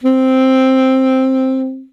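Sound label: saxophone alto C octave0 vibrato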